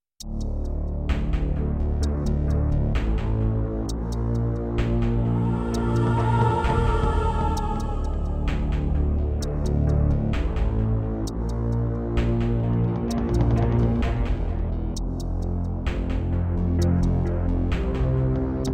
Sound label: Kawai GMega; Descent II